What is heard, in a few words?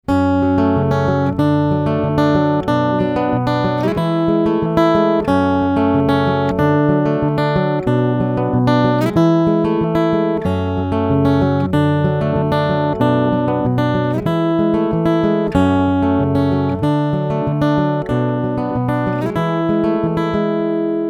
Ibanez AAM700CE NT finger picking undersaddle pickup